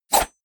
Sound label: blade whoosh small